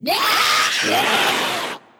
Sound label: Kraken VO; Legend of Keepers (gamerip) (2019)